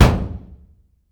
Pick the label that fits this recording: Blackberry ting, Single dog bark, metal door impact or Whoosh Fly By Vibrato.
metal door impact